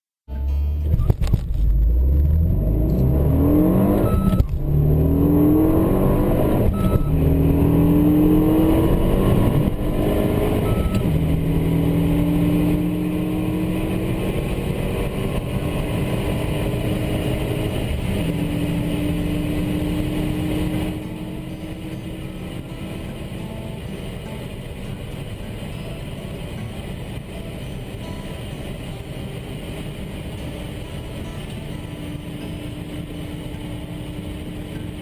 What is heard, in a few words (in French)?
Harmoniques